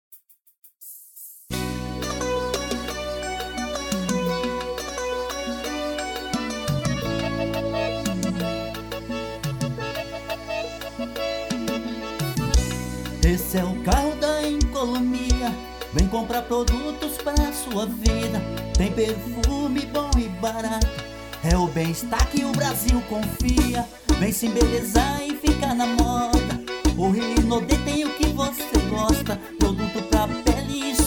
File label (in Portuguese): jingle, brega romântico forró; hinode